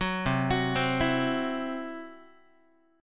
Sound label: C Major Flat 5th Guitar; arpeggiated; CMb5; 9,8,9,10,8,x